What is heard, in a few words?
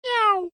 cat indifferent